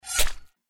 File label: sploutch